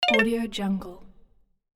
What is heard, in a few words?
short arp down button